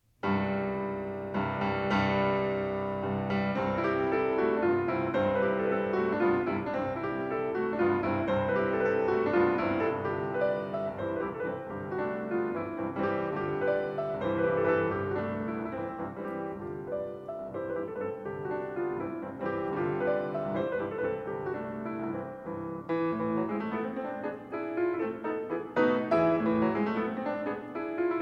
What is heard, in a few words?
Mazurka C-dur; Chopin; op. 56 #2